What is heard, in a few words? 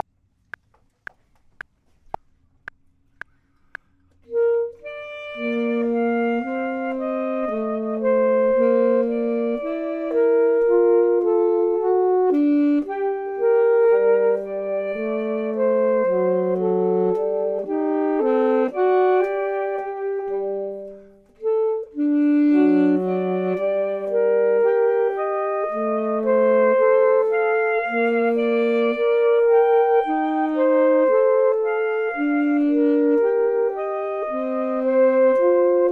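top, Saxophone and Clarinet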